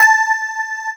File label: SYNTHESIZER DIGI DECAY